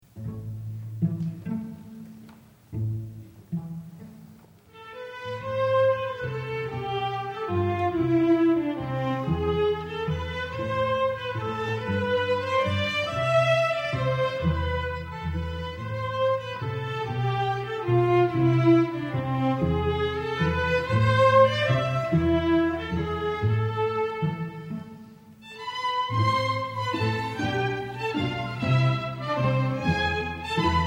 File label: sicilienne strings